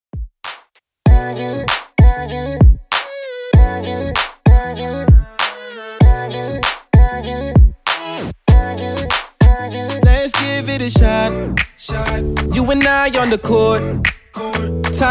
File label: 2ks Redrum 97bpm